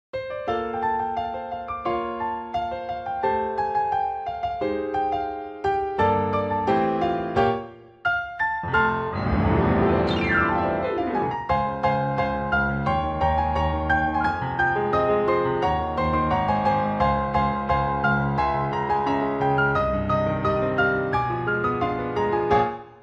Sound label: piano ver.